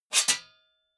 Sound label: metal sheet scrape fast double; metall